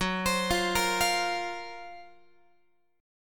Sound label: Gb Major Sharp 11th Gb Guitar; GbM#11 arpeggiated; x,14,15,15,14,16; 4A,4E,5A,5D,5G#,6C#